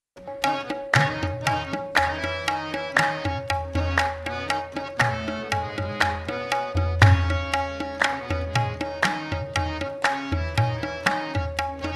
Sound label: harmonium